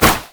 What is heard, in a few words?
satchelcharge; Ziggs